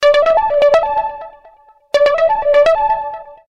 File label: twice nice ringtone